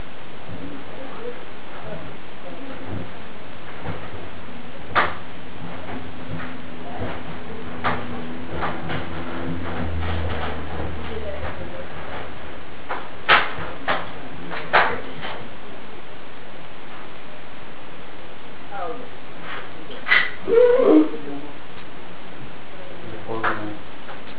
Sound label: recorder in dining room people outside